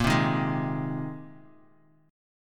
A sharp 7th Suspended 2nd Suspended 4th, Bass Standard; strummed; JGuitar